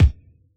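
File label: Bass Drum HipHop, Yamaha DJX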